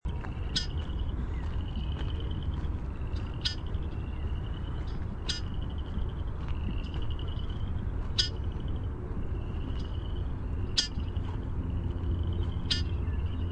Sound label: RWBL, nature sounds